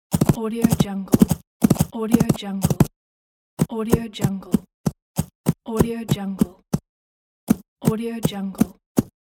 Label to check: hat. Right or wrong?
Wrong